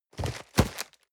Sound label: cardboard box medium turn hard short; objekte